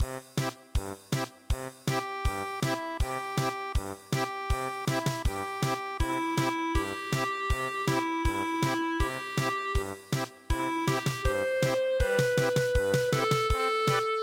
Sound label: Wiegenlied German Polka, midi traditional